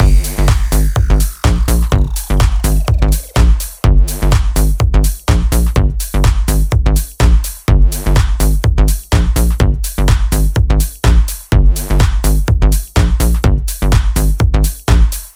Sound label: Electro